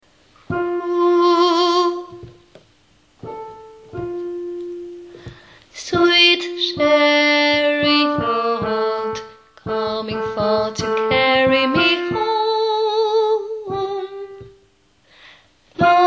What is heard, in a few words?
SWING LOW ALTO